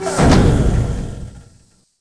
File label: ramp end; Star Wars Obi-Wan; XBOX